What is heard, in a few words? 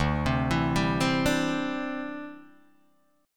D 9th Suspended 4th D down Guitar DADGAD